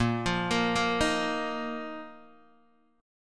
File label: Bb Suspended 4th Bb down Guitar Open D